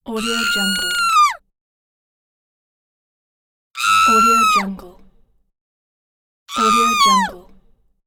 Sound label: witch scream